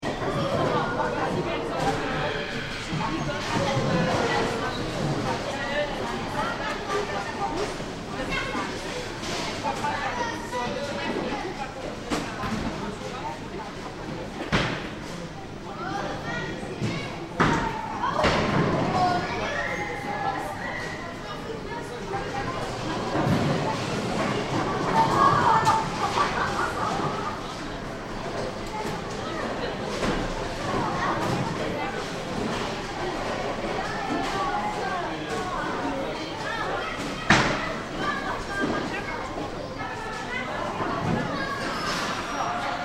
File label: le bowling; liege; 2009 deambulations